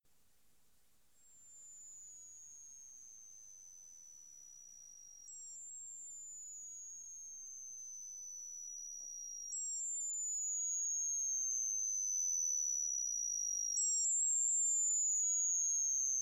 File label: alien bog